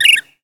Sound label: BIRD; 1000fx; ANIMALS